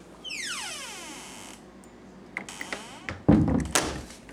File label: closing door